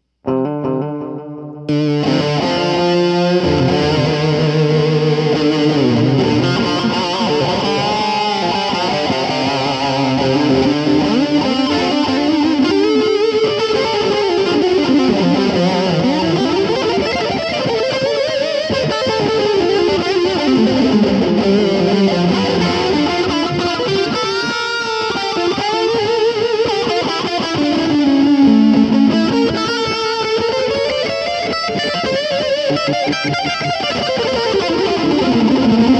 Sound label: Fire it Up! (w-echo)